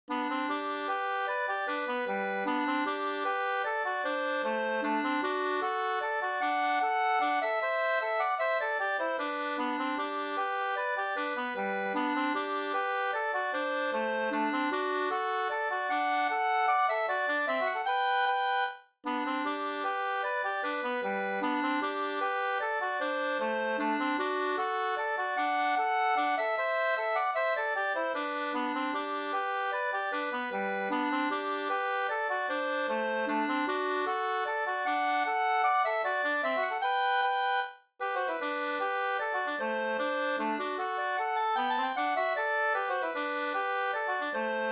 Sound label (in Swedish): mazurka; Gustavs morsa Flen 2st